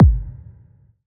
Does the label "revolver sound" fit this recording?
No